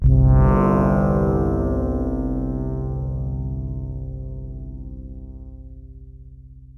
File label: synth brassey corn pad interval lower